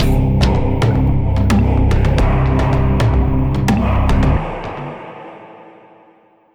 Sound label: basicMood Cueva Bosque